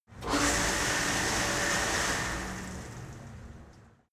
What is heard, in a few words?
cleaning machine start running stop short